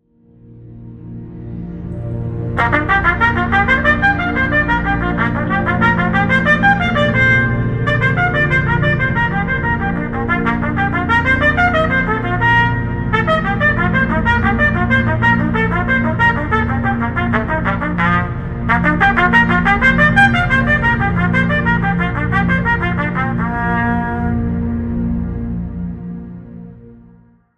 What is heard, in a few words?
Drone Articulation Study